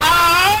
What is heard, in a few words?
"ah"